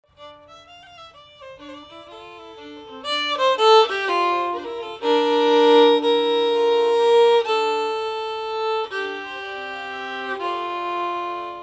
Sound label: carillons, nyckelharpa